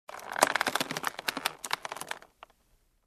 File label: Breaking Creak